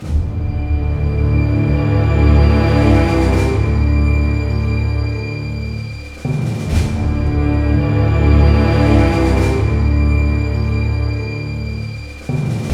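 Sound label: 72 danger, orchestra; East West; Scoring Tools; Danger There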